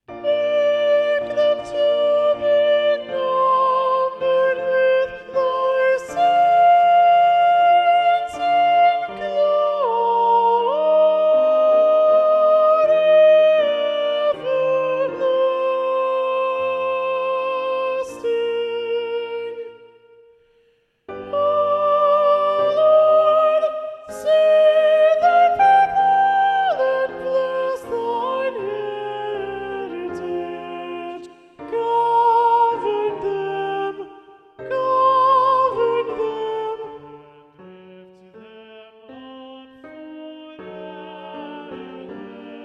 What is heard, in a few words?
Make them to be numbered Soprano; Handel; Te Deum